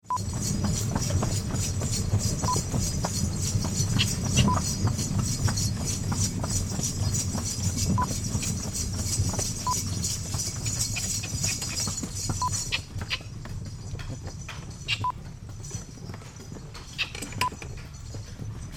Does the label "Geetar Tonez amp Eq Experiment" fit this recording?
No